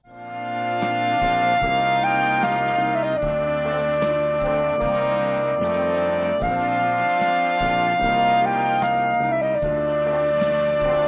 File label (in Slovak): Tichá noc instrumental